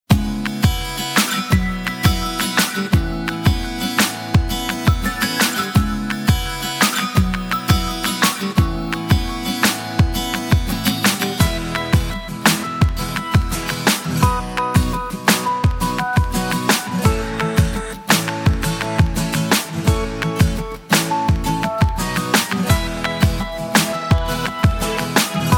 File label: happy bubbly